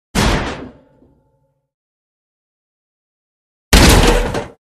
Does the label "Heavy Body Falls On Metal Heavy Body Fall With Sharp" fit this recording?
Yes